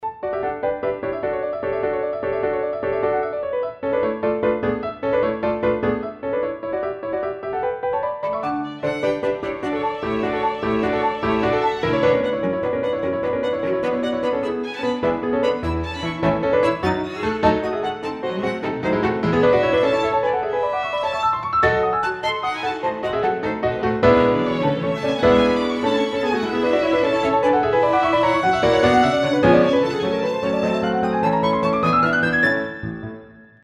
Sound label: Street piano